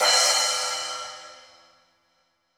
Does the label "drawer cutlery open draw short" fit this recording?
No